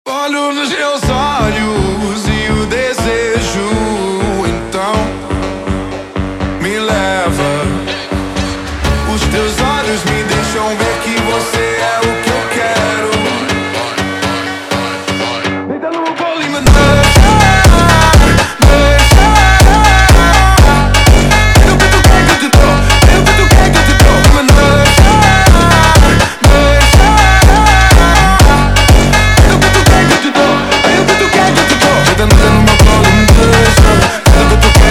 Montagem slowed

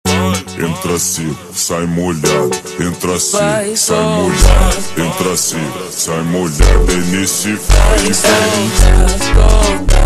Montagem super Slowed

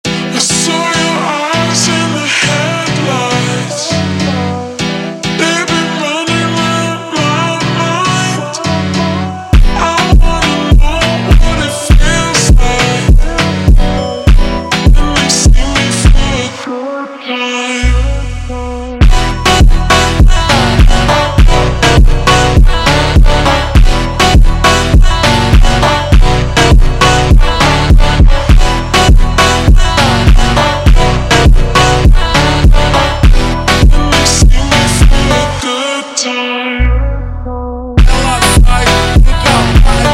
Montagem ultra Slowed

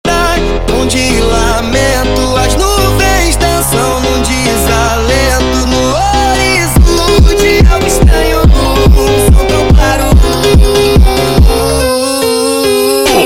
Funk sped Up

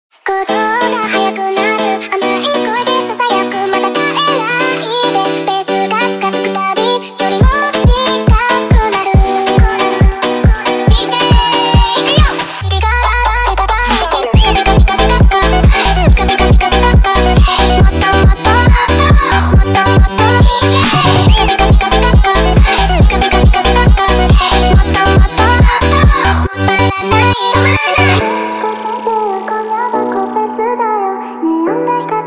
Montagem sped Up

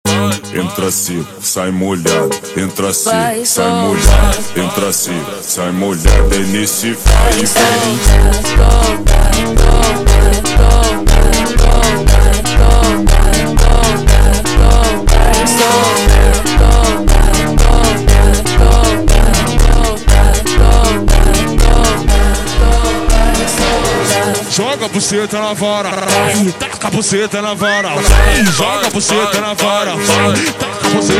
Montagem slowed

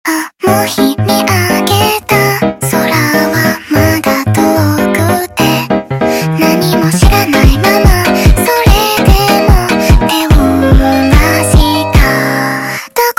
Montagem sped Up

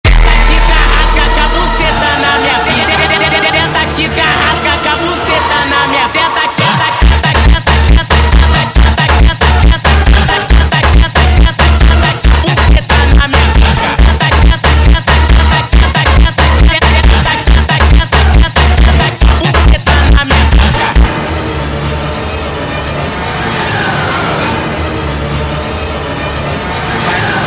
Funk Sped Up

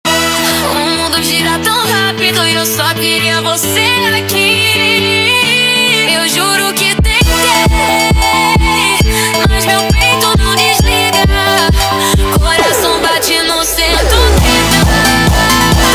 Montagem

